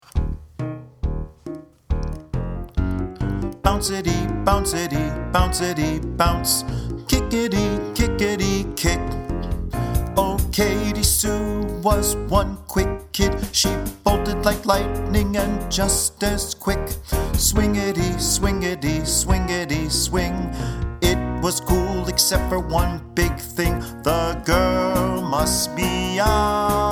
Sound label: bouncity kickety swingety